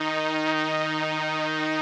505, String Machines

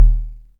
dry kicks; Quasimidi Rave-o-lution 309

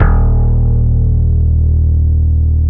BASS, D#1; Zero-G; Chemical Beats; BASSES

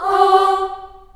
G3 child AH, choir; CHILD AHS

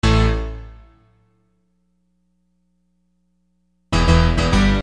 EGrand; Construction Kit; HIP-HOP; Sonic Foundry (Sony Creative Software)

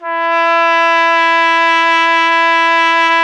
BRS TP E 04R, Trumpet; Solo Instruments; Miroslav Vitous

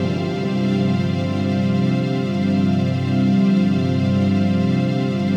TRANCPAD; Best Service; XX Large Pads; Sample CDs

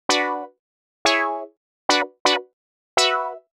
125 stab E, Keys, Nu Jazz House

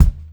Funk-Groove Drumloops; USB Soundscan vol.38; AKAI; 90 s Sample CDs; 87 STRGHT

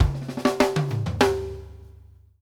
SHORT TOMS, DRUMWORKS FILLS 96

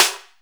Snare, Akai MPC500

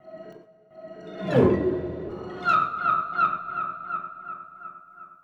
passing, Drum N Bass; Sonic Foundry (Sony Creative Software); Knutrix Drum & Bass

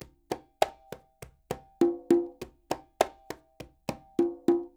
NYC Percussionworks loops; Sampleheads; SF LOOPS 100

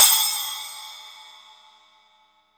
SPLASH, Drum; East Collexion; Drum 1 Dry

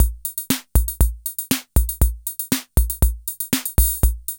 POPS, KR55; INIS - Funky Xtreams; KR55 LP4

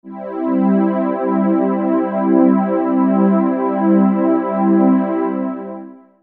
Digi Pads; Jungle Frenzy 1

